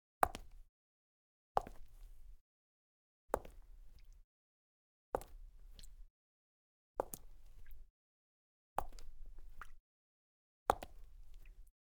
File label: Cement Wet High Heels, Foley Footsteps; Sound Ideas